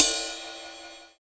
ride single shot f sharp key, ride cymbal sample tuned to the F# note